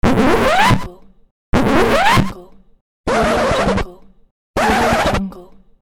record scratch

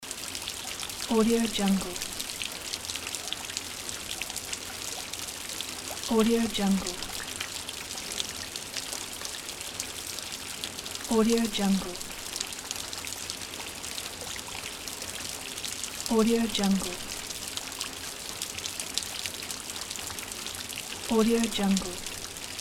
rain flows and drips loop; tonwagensfxlab

